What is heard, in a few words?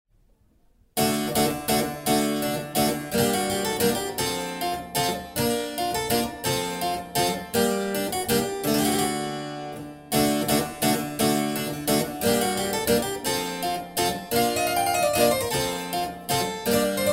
CLAVICEMBALO; La Cara Cossa; Pavana in passo e mezzo